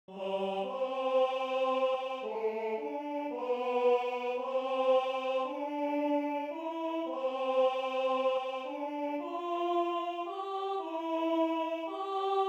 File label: Game Ending Song - Alto